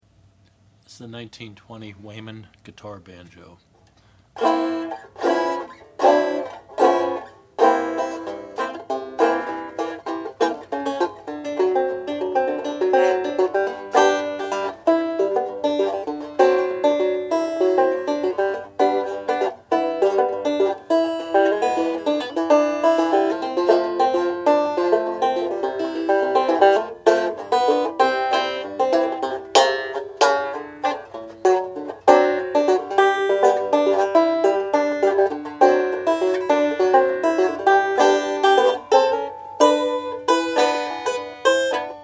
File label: weymann no 40; natural maple